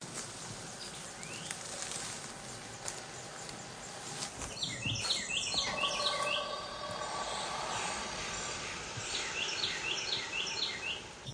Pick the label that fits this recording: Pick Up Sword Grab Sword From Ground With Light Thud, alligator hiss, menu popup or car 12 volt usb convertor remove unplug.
alligator hiss